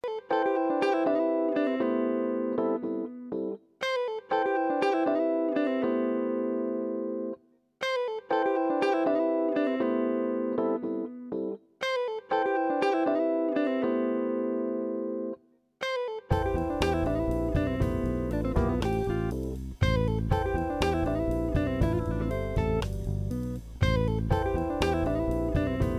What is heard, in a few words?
Jazz